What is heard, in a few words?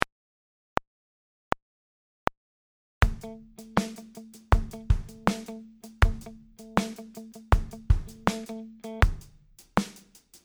groove